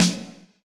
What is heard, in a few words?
drum off; HeavenStudio; lockstep